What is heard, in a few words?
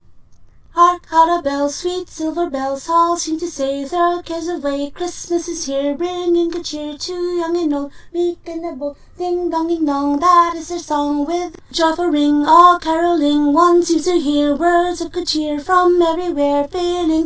Carol of the Bells, layered, a capella, caroling